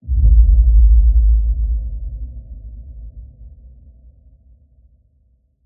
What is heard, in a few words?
Impact; PMSFX